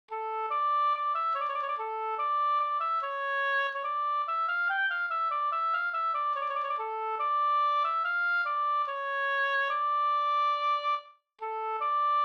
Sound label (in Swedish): skanklat